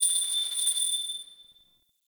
Town Door Bells, Ambience; Warframe; Duviri

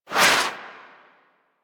Rock Punch Swing; Warframe; Brawler

